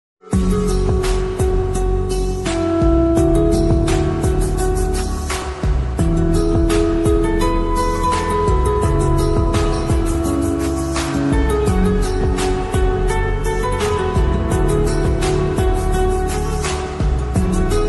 slowed reverb instrumental ringtone romantic ringtone